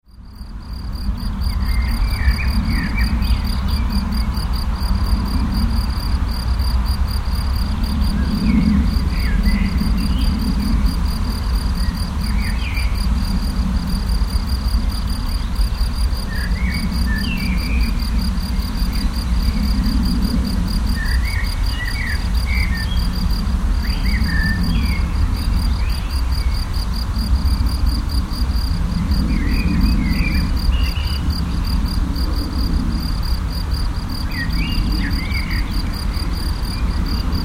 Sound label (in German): Feldgrillen